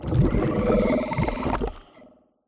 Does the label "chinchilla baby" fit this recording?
No